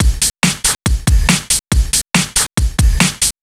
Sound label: LoFi Beats, 140 BPM; Vengeance - Essential Clubsounds Vol.4